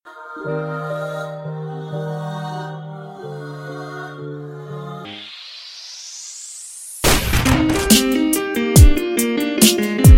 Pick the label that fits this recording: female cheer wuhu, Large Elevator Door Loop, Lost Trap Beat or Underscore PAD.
Lost Trap Beat